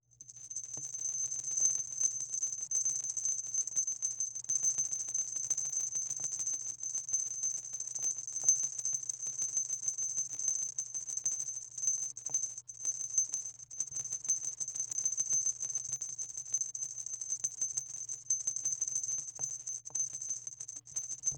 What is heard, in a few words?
Vacuum Hose, thin plastic membrane, wire coil, dust, collapsible, half expanded; 5ft L; 1in Dia; tiny bell; Eiravaein Works